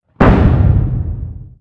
Impact

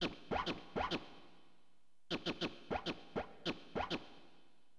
scratch; LiveAct Pool; AcidJazz Funk

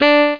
saxophone

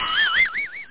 lose; Simple Faro

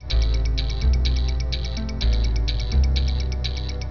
music; Egoboo

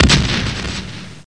Cannon; Leonardo The Inventor; MONALISA

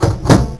shutter

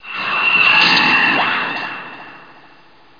moan; ambience

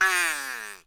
Buzzer; ROBOTS; circuits

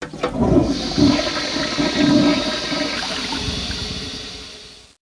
FLUSH

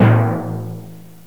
Timpani A; Drums; TG300B; GMPlay

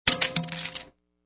Trash Drop Item; Flyakite OSX v3.5; Iceman

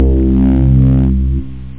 syn piano

